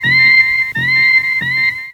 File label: sickbay sound; TOS